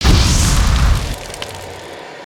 Fig On Fire Blue Boom

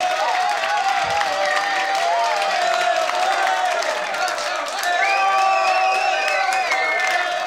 crowd loop

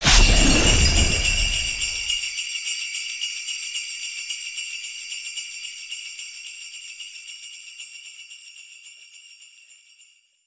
Vehicles Holiday Boost; aronwk collection; ndaudio

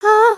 fear

